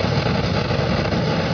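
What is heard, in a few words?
flamethrower, weapons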